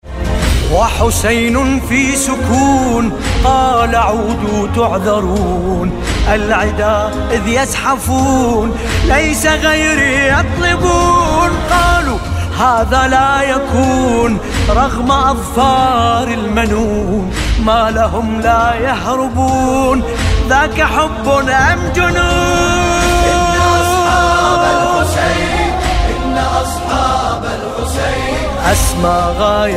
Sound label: Mobile ringtone